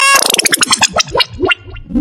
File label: Cool Sms Effects